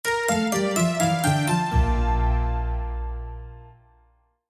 Jingle Eyecatch

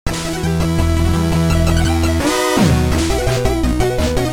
music boss intro

